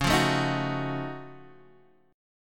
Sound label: Gb Minor Major 13th Db